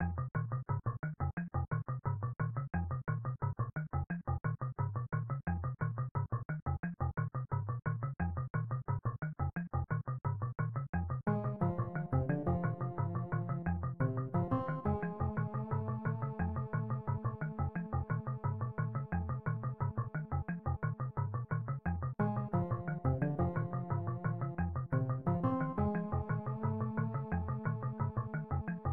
Underground Theme; Underground BGM; Super Mario World; Super Mario Maker

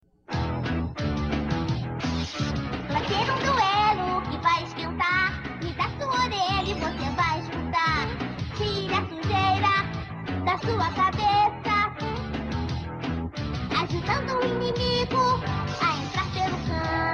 Music Sample; Sizzle Portugese

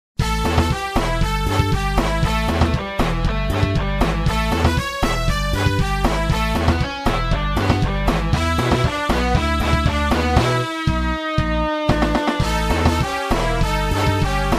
Trophy Celebration; MPT; Wario

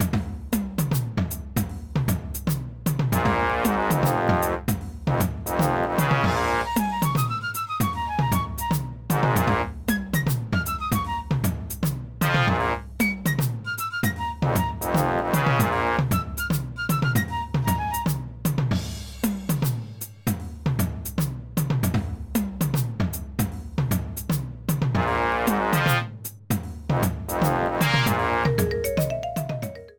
Game Over music

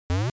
Jump

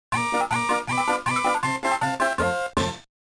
Bonus Game Clear fanfare; SMA2

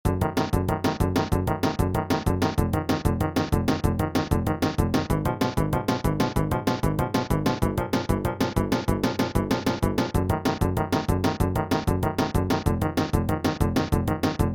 Boss theme; SMAS; SMB2; Birdo

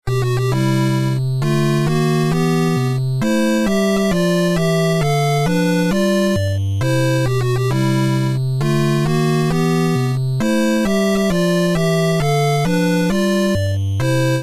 Ending Theme; Super Mario Bros.; Super Mario Maker; Rescue Music

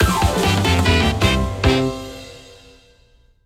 Battle Win

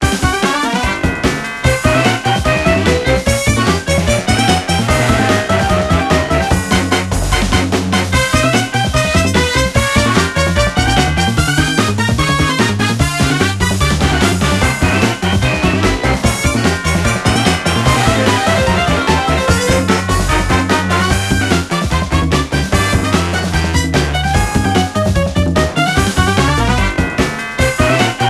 Jazzy, a Mario Party 6 minigame music rearranged

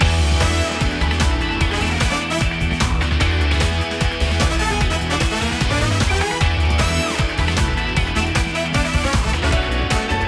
final lap; splash circuit

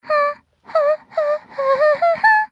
Princess Peach humming; PMTTYD

